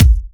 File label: Bassdrum